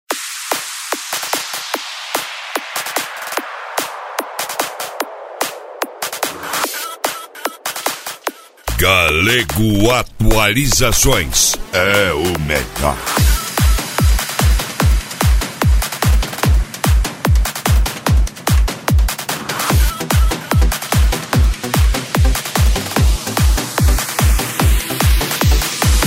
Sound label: psy trance; cd galego atualizacoes dezembro 2022